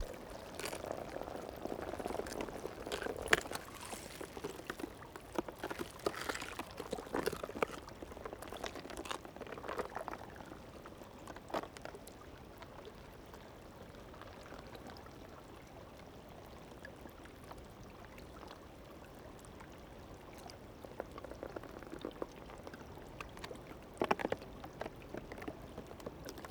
Ice on stream wind; MS stereo; Ivo Vicic; Winter textures vol.1

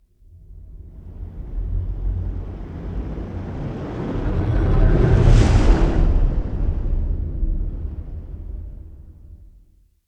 Scifi Whoosh Big ship pass by; Scifi Whooshes; Dizzy Duck

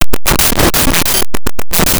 Rhythm

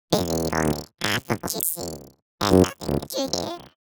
Vox, Circuitbend; 99Sounds; Mangling Audio

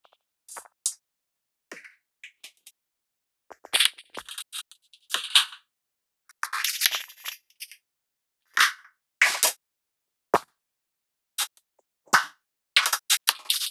Loop, 70 bpm, Circuitbend; 99Sounds; Mangling Audio; Loops

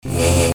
Saber; FXHome

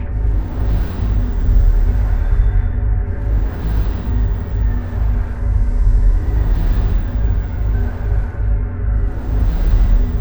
amb Space Ship rumble low pulse; SoundMorph; Solar Sky

